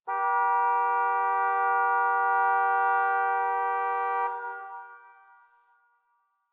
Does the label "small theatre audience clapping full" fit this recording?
No